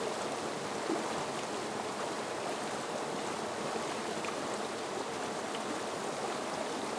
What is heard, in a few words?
Looping river water